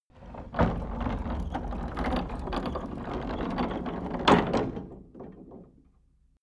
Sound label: wooden rolling gate; tueren